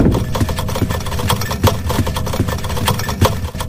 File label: doors lights flicker